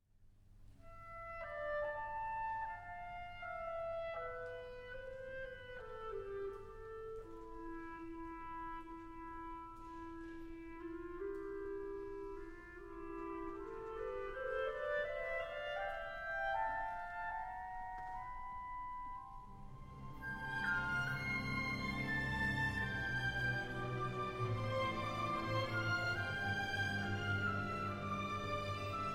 Piano Concerto in C Major, Andante - Allegro (Live); Live in Australia, 2000